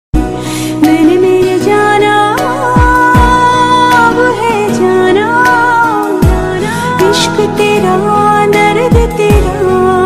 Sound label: Female Ringtone; Indian Pop